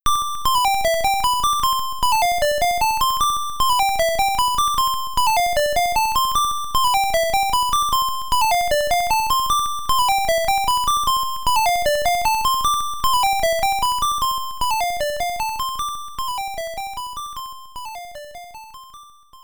Bonus BGM ~ Pattern Start ~ Bonus BGM ~ Bonus BGM ~ Bonus BGM